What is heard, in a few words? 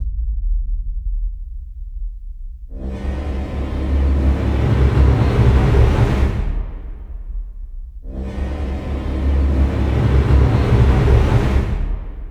See How They Run Drum Mix, Horror; Anger Music Library